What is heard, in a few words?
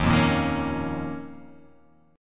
D sharp 7th Suspended 2nd